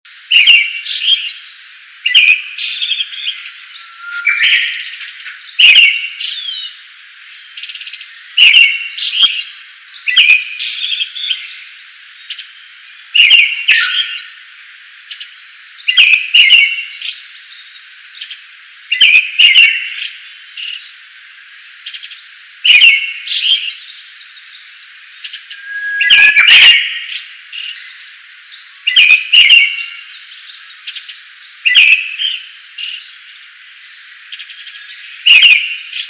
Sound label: trees birds